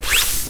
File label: Material friction